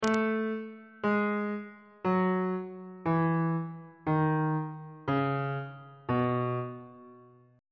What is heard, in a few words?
b mixolydian mode descending on bass clef